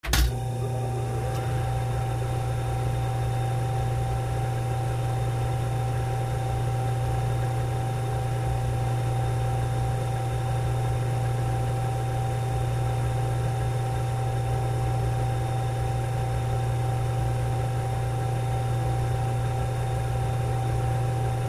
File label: Small Motor Under Stress, Medium Perspective, On Idle Off, W Whine Down.